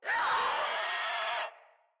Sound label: Infested Crawler Attack Short, enemies; Crawlers